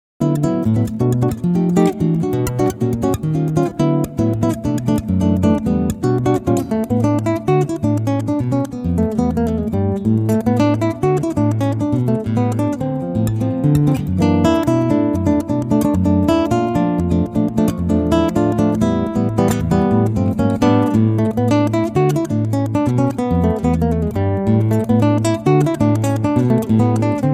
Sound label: samba